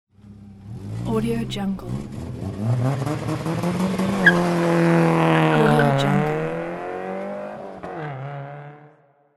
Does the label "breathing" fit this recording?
No